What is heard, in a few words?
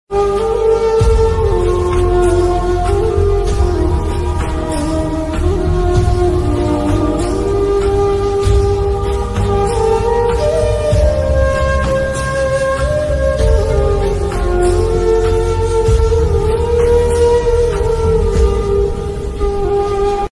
sad ringtone, love ringtone, romantic ringtone, punjabi ringtone song